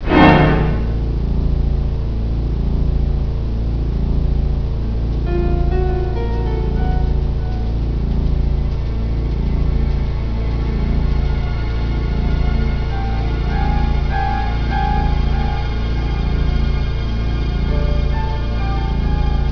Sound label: Song